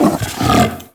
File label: sleep idle snore fidget, Kubrow; Enemies; Warframe; Tame